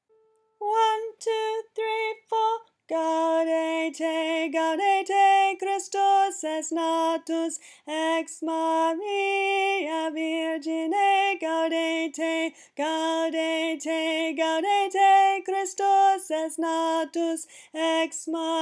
Gaudete alto

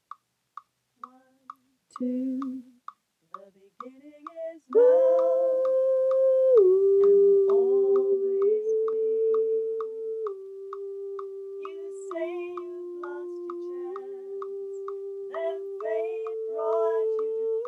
high part; Folk Choir music

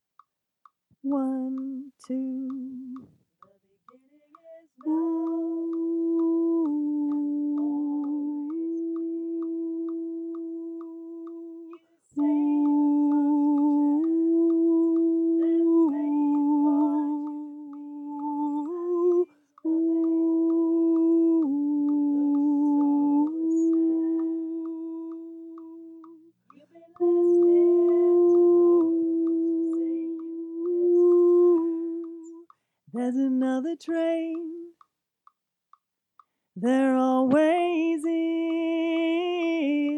middle harmony

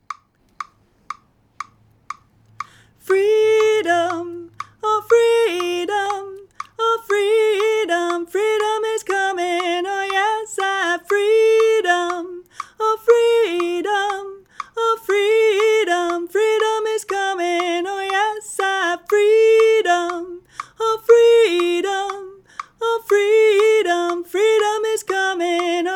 alto with click

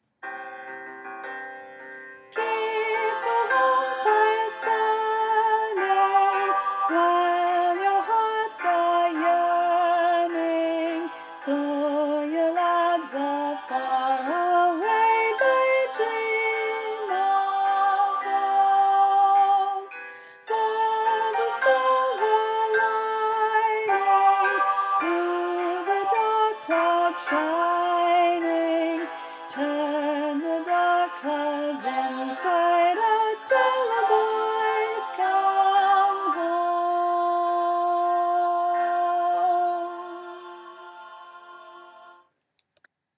soprano; Home Fires; Voices of Remembrance; Folk Choir music

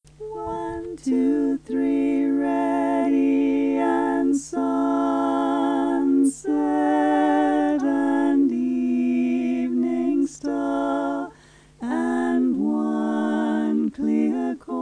Melody, Folk Choir music; Crossing The Bar

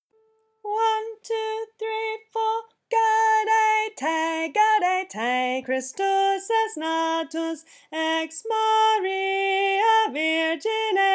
bass high octave; Folk Choir; Gaudete

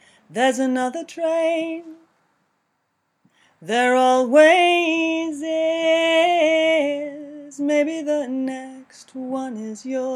chorus melody

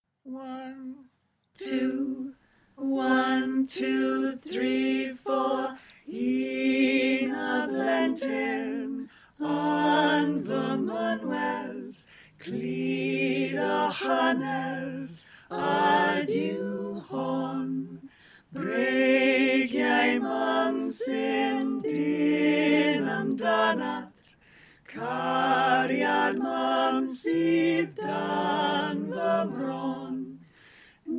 Tenor panned R; Folk Choir; Suo Gan Resources

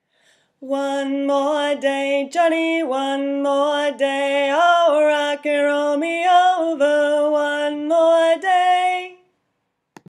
One More Day tenor, FolkChoirmusic

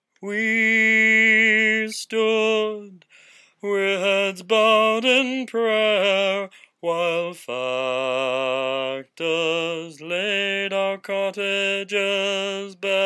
verse, Folk Choir music; morbheanna verse 2

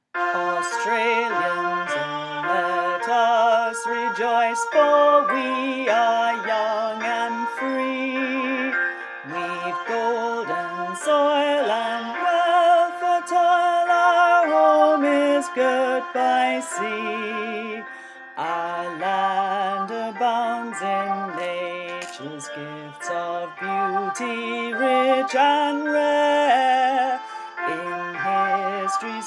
Tenor; Advance Aust Fair; Voices of Remembrance; Folk Choir music

